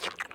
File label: add splodge; Dorothy And The Wizard Of Oz - Cookie Magic